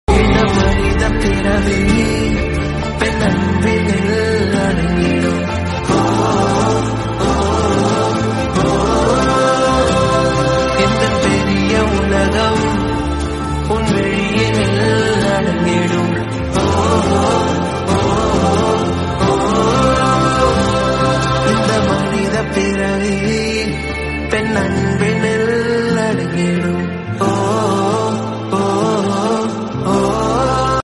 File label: tamil song; dada movie